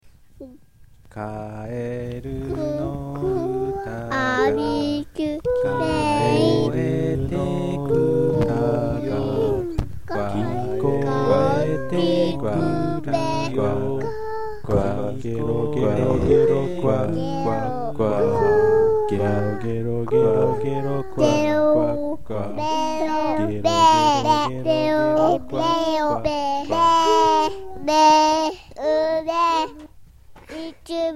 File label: loop, chorus; Demonstration of Circle Canon Chorus; Frog round by a father and his baby